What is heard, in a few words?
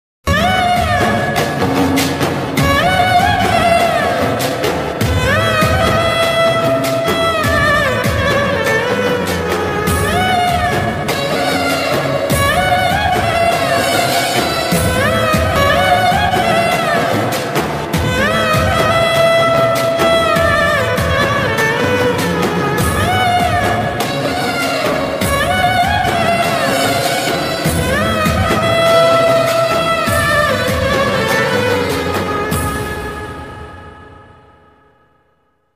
love BGM ringtone; thandel movie